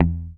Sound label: HOF MUT E2, HOFNER MUTE, Bass